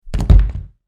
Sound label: Impact Body Fall Wood Floor Slow; Wrestling and Struggling